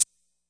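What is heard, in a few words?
classic hh Shaker; Hip Hop; STYLE KITS; Classic Hip Hop Mega Kit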